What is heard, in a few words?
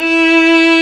ARCO VIOLIN, Arco Solo Violin; E-MU Producer Series Vol. 2 – More Studio Essentials